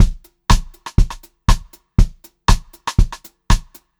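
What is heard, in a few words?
120 pop variation, Pop-Rock Drumloops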